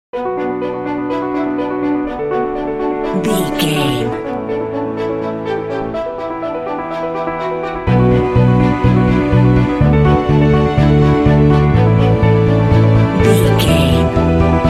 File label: inspirational cinematic beauty and elegance